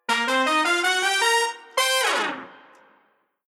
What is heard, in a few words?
Horn Kime Horn Only